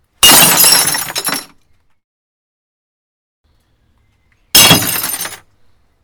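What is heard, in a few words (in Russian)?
razbityi bokal, broken glass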